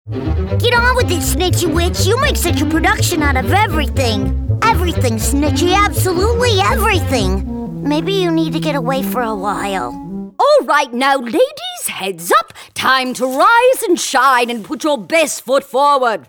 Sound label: animation